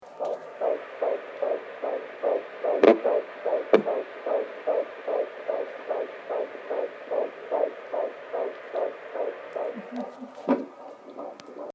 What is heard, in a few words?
Baby beat